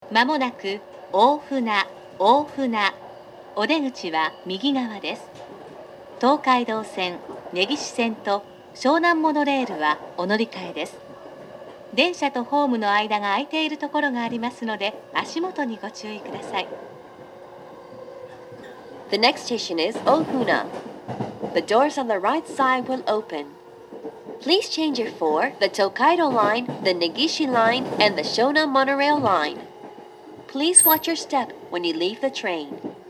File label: step